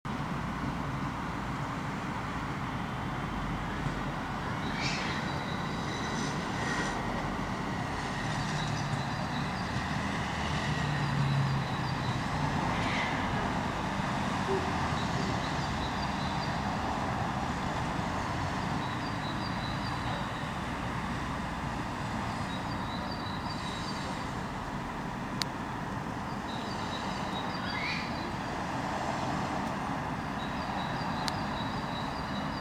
spring soundscape

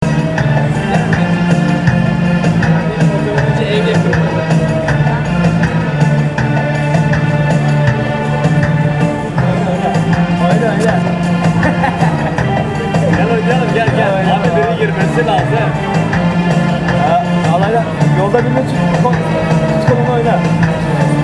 street organist from diyarbakir soundscape

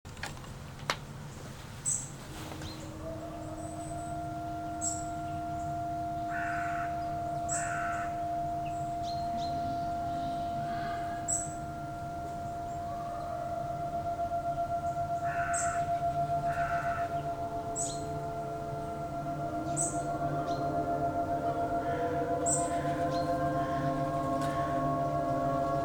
ataturk sirens soundscape

